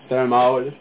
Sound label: Male